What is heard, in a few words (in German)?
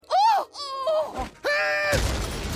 Laute, Schrei; Futurama; Mrs Astor; Eddie